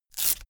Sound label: velcro large open quick